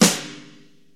70s avantgarde acoustic snare sound g key

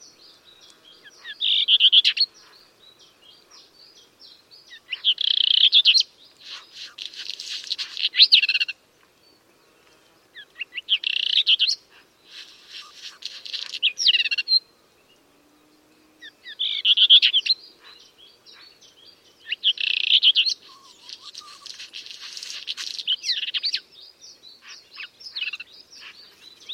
black redstart bird song

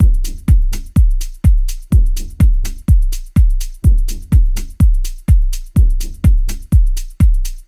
house techno beat passage 125bpm, Am, 125; drum loops

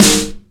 prominent snare sample g sharp key; snare drum

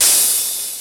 crisp open hi hat c sharp key; Duration 0:01; Samplerate 44100 Hz

